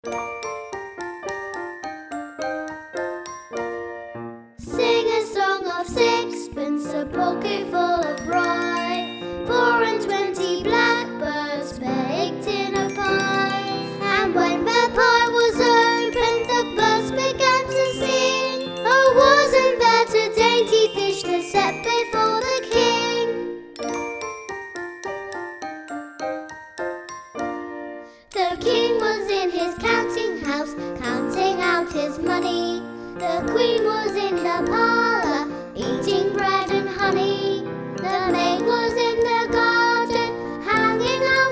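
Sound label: sixpence, musicbox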